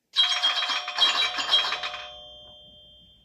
pinball

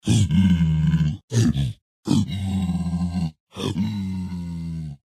zpig angry

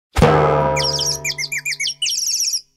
bong head wack

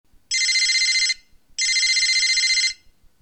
electricall, blackberry bold 9700

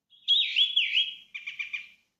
zte notification bird

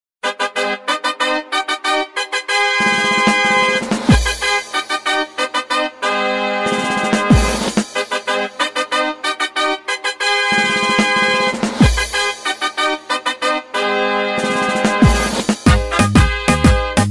anthem

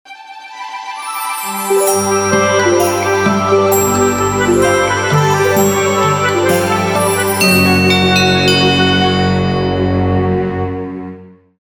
magic, volshebnaia muzyka dlia fona bez slov

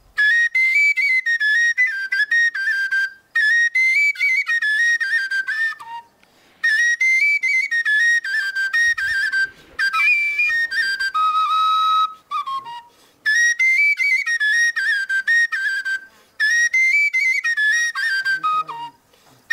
sopilka

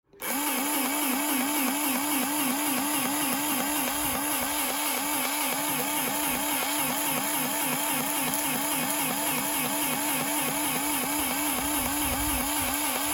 hydro blaster, vodianogo pistoleta

